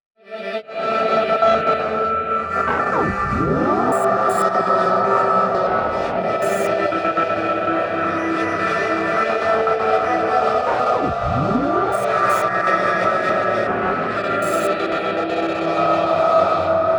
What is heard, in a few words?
Processed Electric Bass